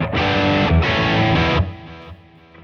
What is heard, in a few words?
90 Chorus Rock E; Loops